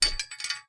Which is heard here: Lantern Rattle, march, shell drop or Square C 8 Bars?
Lantern Rattle